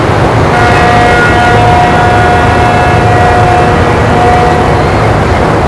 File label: dock amb; WhiteOut; XBOX; bokujo